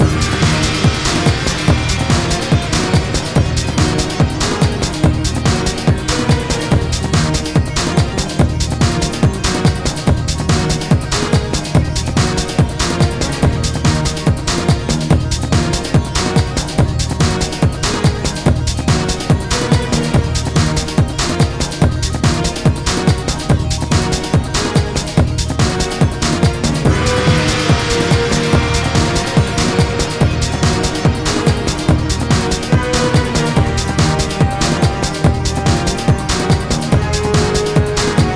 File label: Battle Loop; Asterix Obelix XXL 2